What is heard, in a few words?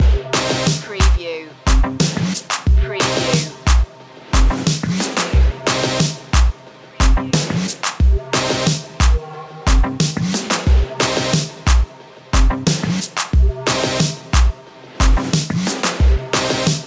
loaded loop